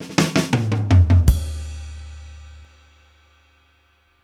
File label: Fills, Monster Beats; Zero-G